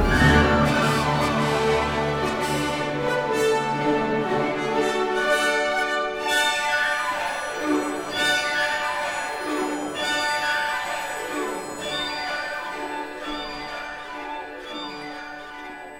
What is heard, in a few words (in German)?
Eine Alpensinfonie (An Alpine Symphony) for orchestra; Am Wasserfall; Strauss